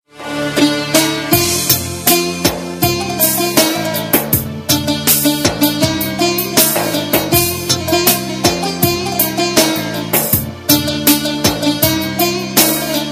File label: country music ringtone; android